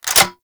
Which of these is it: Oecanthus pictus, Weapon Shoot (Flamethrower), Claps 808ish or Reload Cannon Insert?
Reload Cannon Insert